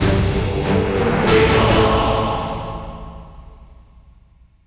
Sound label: Load Out Sting